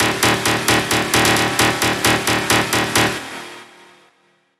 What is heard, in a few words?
Stab; Serum